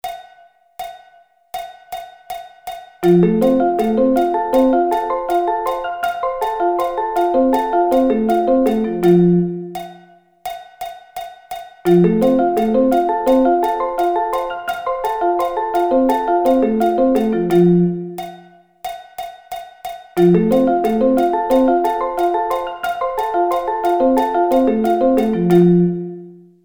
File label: F Arpeggio Inversions